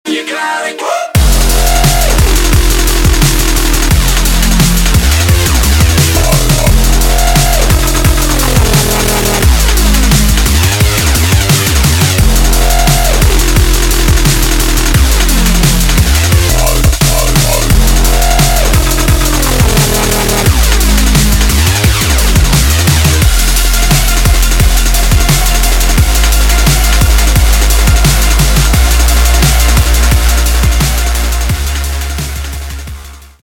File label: bass